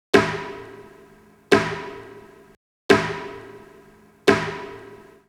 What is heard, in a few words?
Perc Hit Loop - Am 87Bpm; Construction Kits; STEMS; Ghosthack; SkyRealm; Kit 18 - Shivering Sea